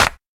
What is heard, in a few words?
sparkly snare one shot b key; snare drum samples